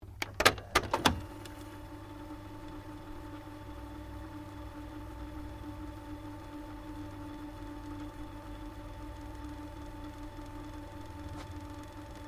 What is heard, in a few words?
Stereo Akai Mini Tape Deck Rewind Stop, Multi function music player; Tape Players; Electronics